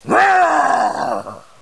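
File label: battle cry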